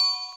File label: Bells